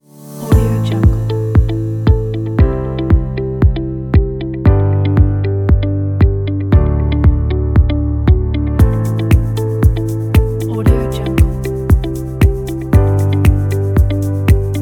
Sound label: motivational corporate